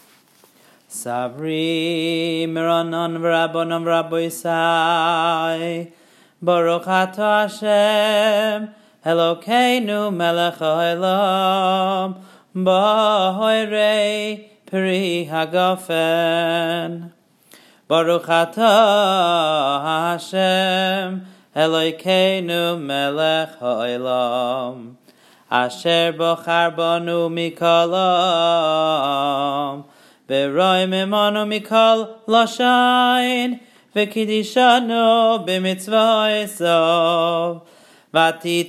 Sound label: Alt Kiddush Yom Tov